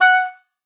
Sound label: F#5, OBOE; Xsample VOL-6